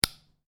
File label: Lego Connect Two Lego Pieces; Miscellaneous Toy Sound Effects; Sound Effects:Toys